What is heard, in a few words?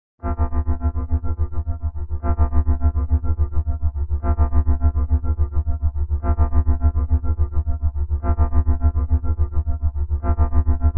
Bed Constant Tremolo